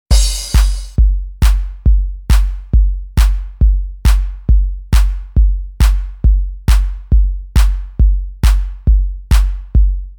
glockenspiel

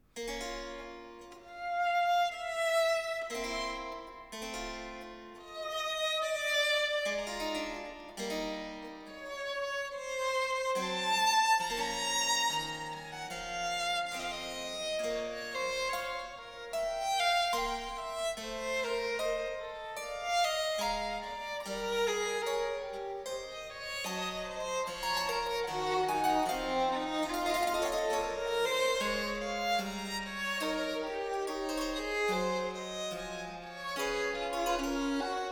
sonata fur violine und cembalo adagio; johann sebastian bach; bwv 1019a

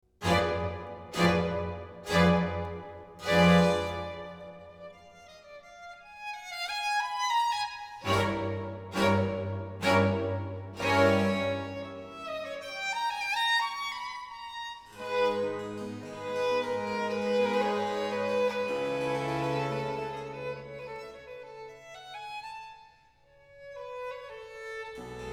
violinkonzert in c dur largo

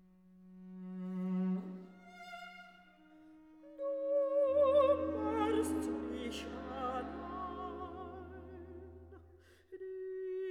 lieder fur gesang und acht instrumente

